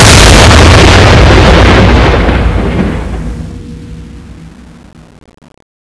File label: charge shoot exp; cstrike; weapons; gungnir